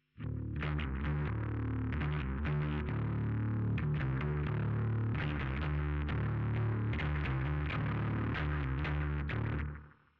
Bass Sansamp

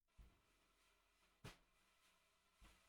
SNR Bot

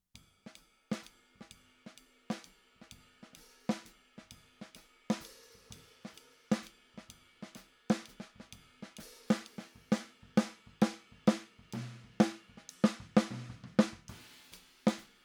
SNR

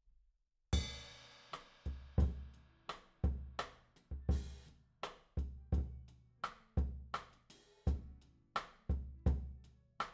Room

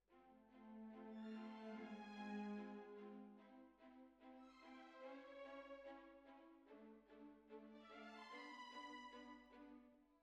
Bassoon, Jazz for piano

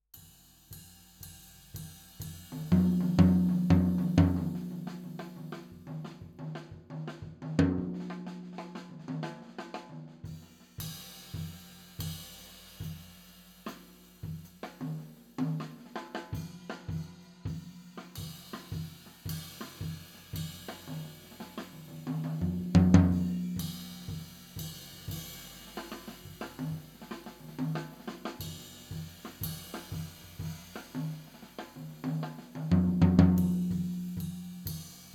Floor

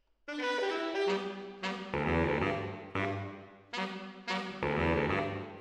MKH AB, piano

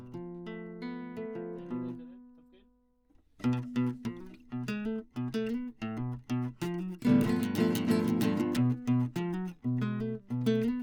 Classic Guitar

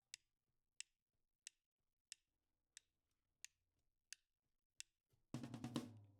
SNR top

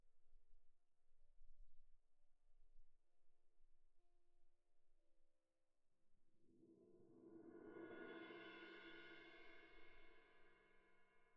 Cymb2 Main

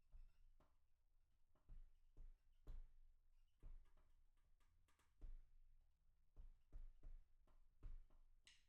Room

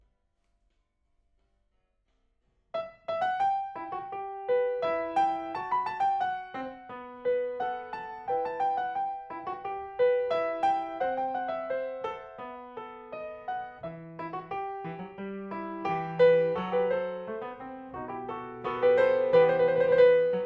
PIANO

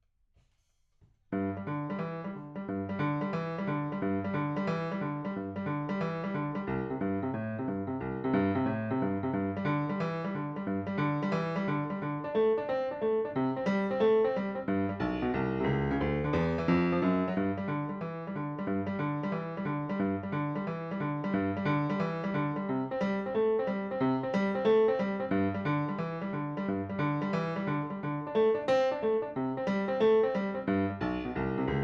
Piano, RNR for piano

